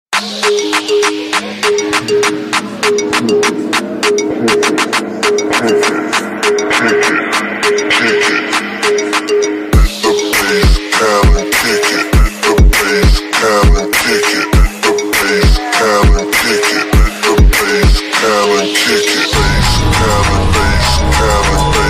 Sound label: Bass